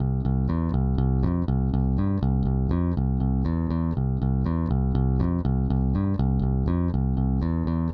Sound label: fret hop clean